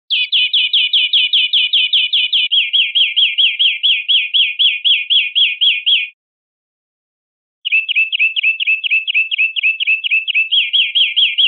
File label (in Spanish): enlazados verderon